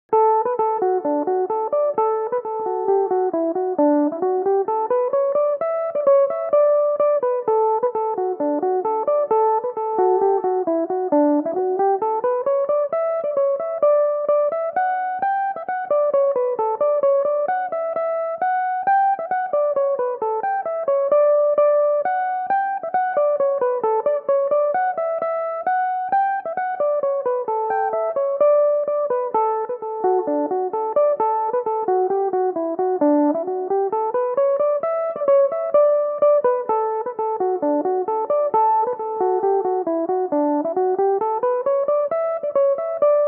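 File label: polka, D major, mandolin; Little Diamond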